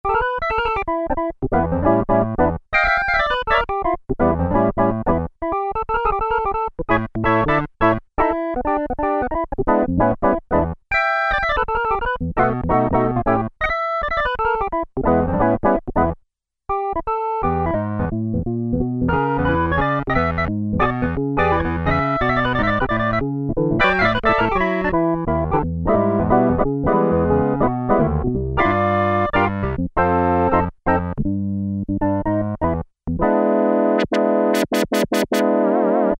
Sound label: Bright Perc. Organ, Korg Poly-800